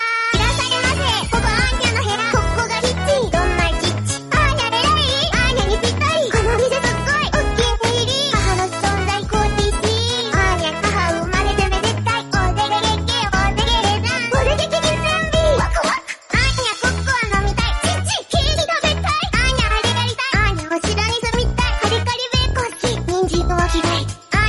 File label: song japanese; anya